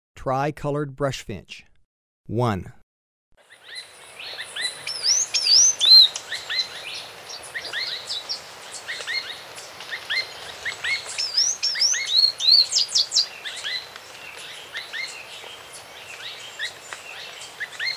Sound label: Tricolored Brush-Finch, Birds of Ecuador; S. America